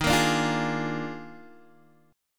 D sharp Major 7th Suspended 2nd Suspended 4th D sharp Guitar Open D, chord; 13,13,12,11,11,x; chordshape